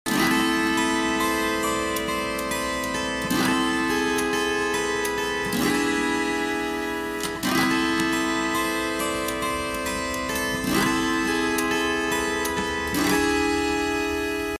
Harp Guitar; گیتار چنگ

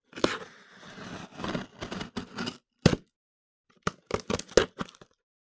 Cut cardboard

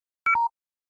Low Battery; کم شدن باتری گوشی

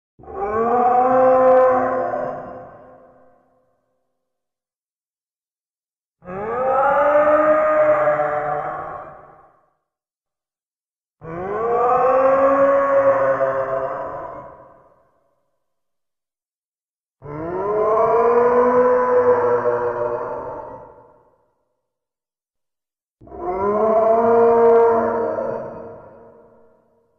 mosasaurs, موساسور

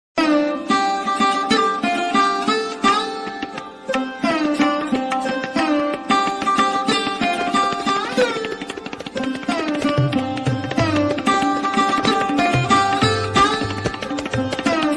sarod

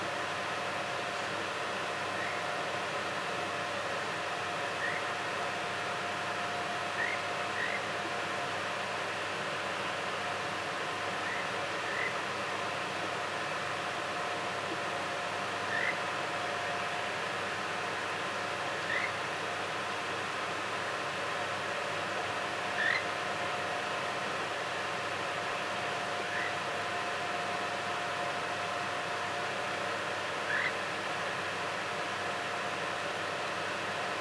Western swamphen

